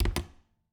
Refridgerator, old fashioned, wooden, steel, brass clasps, hinges, close; Eiravaein Works; Vaeyan IV